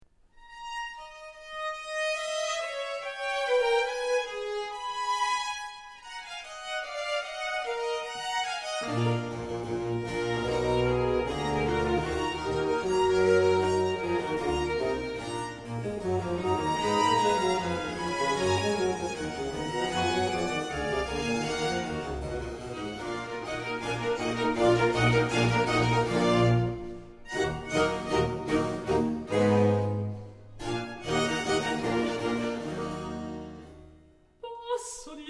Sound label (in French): alto, Voix de l'opéra; cartable musical